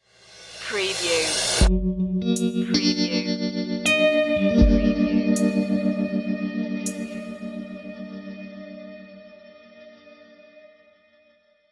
spooky guitar pick stinger

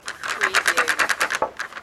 Billiard, Pool, Snooker - Rack up balls in frame

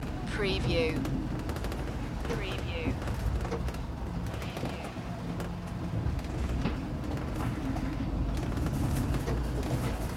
pickup ride groan; loop

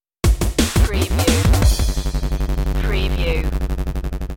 new dnb dream stinger

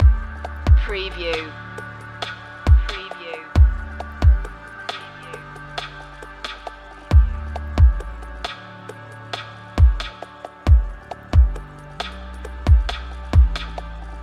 sunshine loop

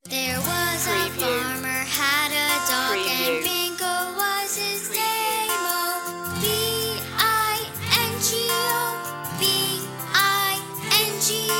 bingo child solo vocal

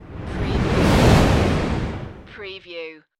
Fireball Pass By

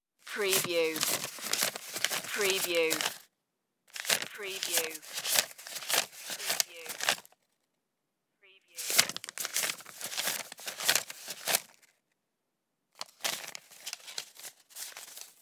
counting bills

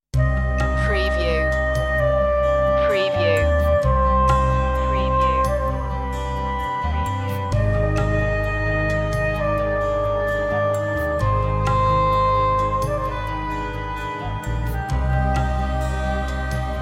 sopranos breath

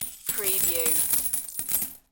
Coins Many Rattling Falling

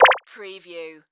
Blip Beep; sound-effects-bible

